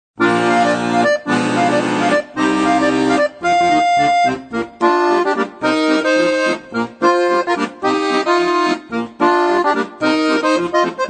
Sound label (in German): Akkordeon; Akkordeon Workshop Band 2